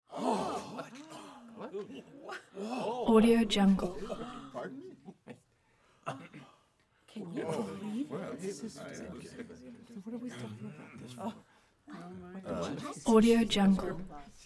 group reactions constant few people gasping

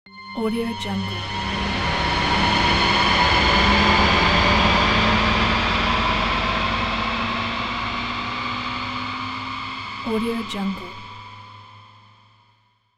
alien spaceship

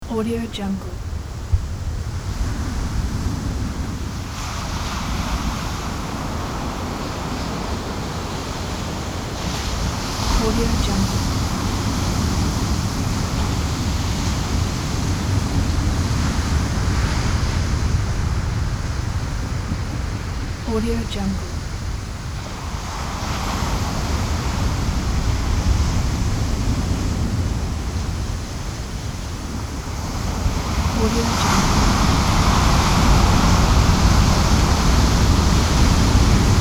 big sea waves

